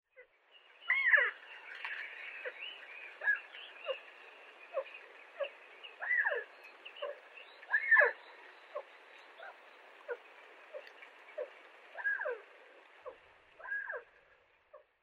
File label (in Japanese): yamadori, oita